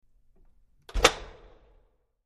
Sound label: Push Bar Door Open